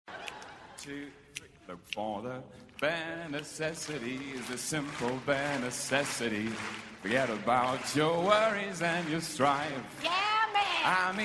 sings ringtone; tom hiddleston

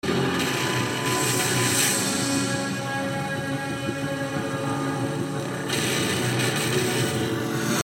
chopper ringtone; ground zeroes

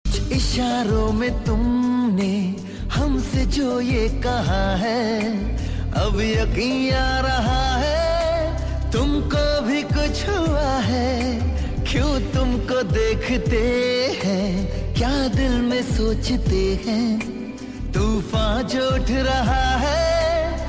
new bollywood ringtone

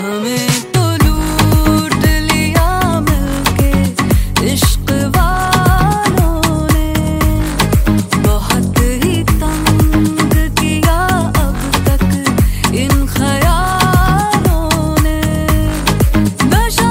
bollywood song tone ringtone

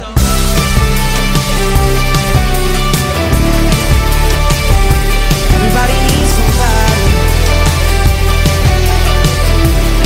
english music ringtone

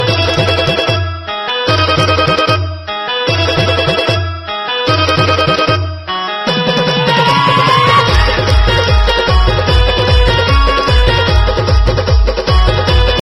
indan music funny ringtone

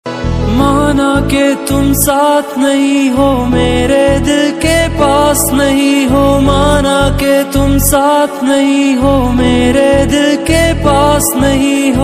love bollywood ringtone

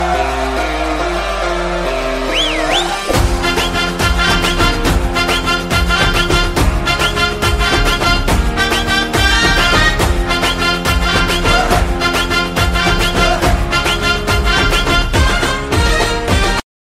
bollywood instrumental ringtone

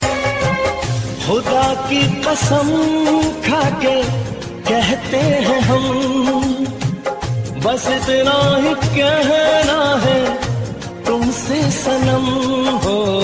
ringtone, bollywood ringtones

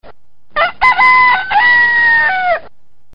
rooster sound ringtone, funny ringtones

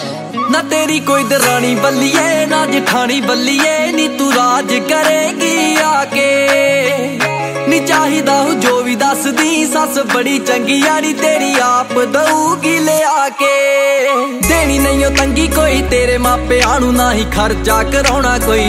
new punjabi song ringtone

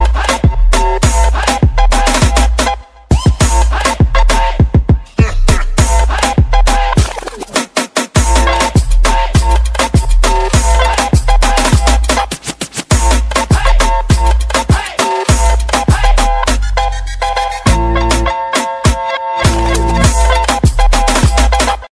remix English theme music ringtone

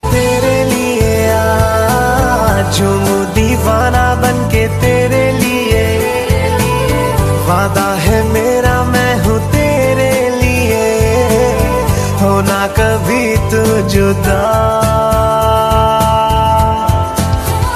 unplugged ringtone

